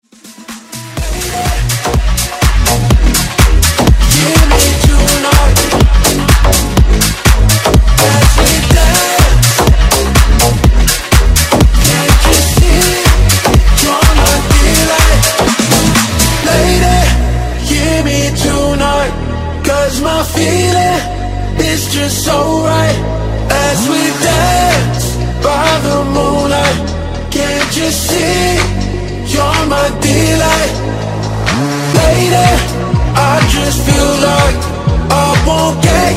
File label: Dance